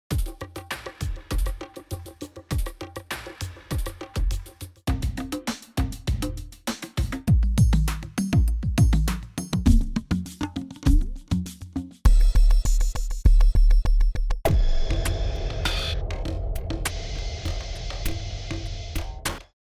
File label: Loop Ethnic